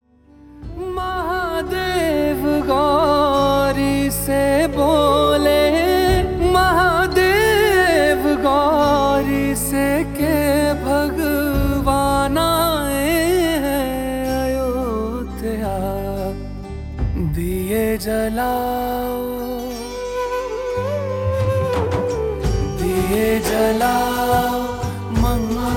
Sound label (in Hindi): Ringtone Ram Bhajan